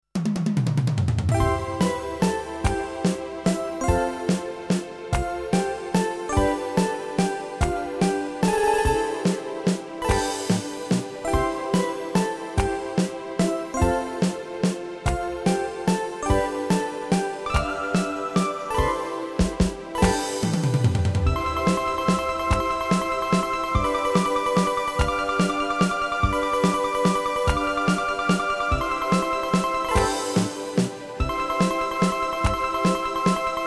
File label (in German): Walzer